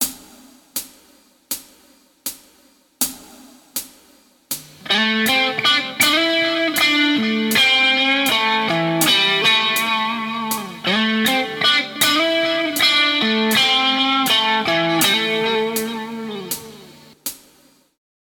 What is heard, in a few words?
Lickbag, Guitar; Guitar Techniques 2019 Issue 290 January